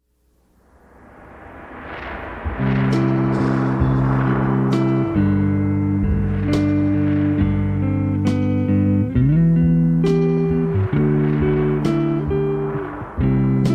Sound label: (instrumental)